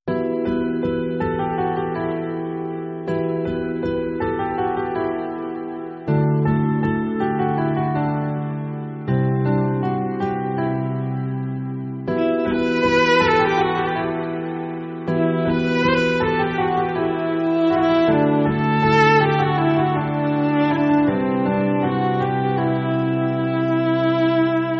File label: Sad Version; marjaavaan 2019